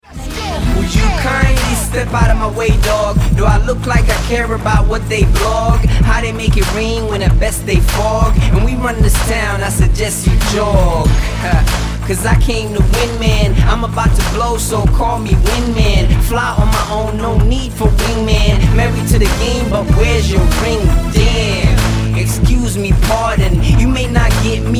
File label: hip hop; hip hop nation